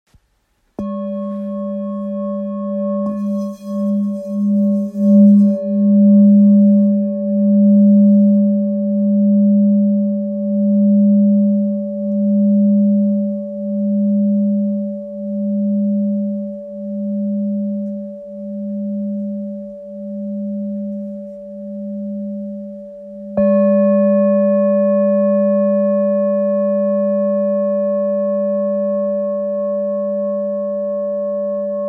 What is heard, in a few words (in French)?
Bol ancien re